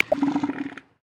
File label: Elephant Seal, Polar Region; Xiaomi Redmi-8A